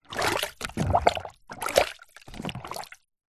foley liquid plastic item movements in plastic container of water plunges